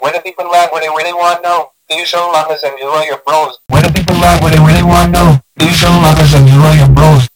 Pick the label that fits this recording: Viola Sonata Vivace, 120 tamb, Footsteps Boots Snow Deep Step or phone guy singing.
phone guy singing